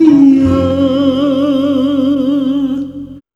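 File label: pad voice; PADVOX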